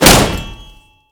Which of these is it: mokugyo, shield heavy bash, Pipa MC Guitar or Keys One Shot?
shield heavy bash